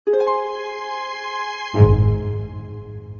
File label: fanfare